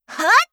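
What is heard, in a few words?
attack, Voice Line, Korean; Eternal Return; Garnet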